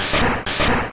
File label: Double Kick; sfx; attack moves; pokemon; gen 1; rby